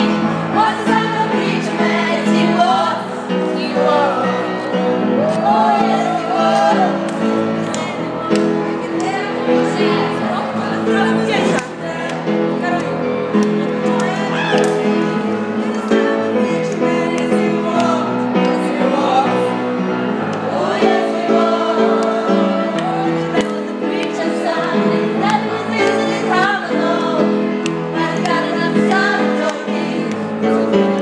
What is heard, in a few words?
magnificat at night near a piano